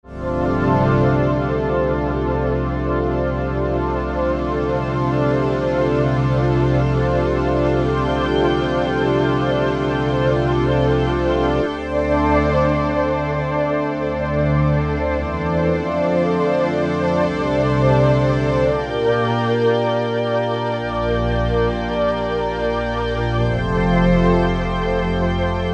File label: Cloudy Pad, Korg MSC-12S Synth; Synth 3